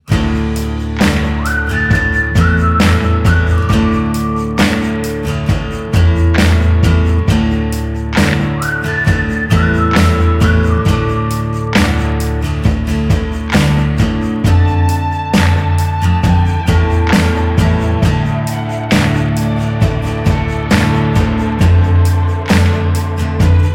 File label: Ring, ringtones; Lumia Ringtones